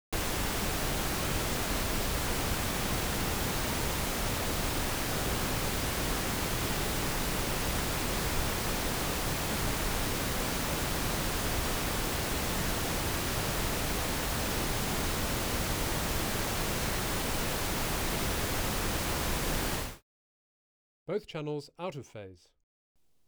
Pink noise bands and phase check, Pink Noise L+R & phase check